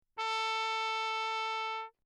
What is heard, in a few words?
TRP MUTE; Bach; Perinétventiltrompete